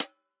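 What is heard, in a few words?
closed hi-hat; eddyflux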